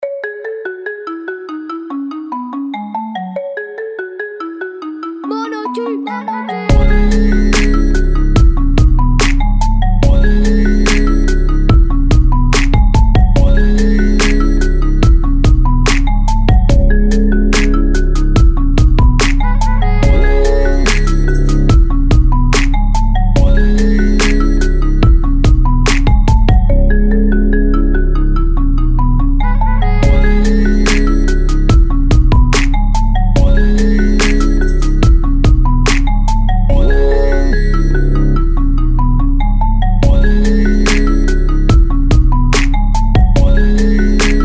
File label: big bells 72 bpm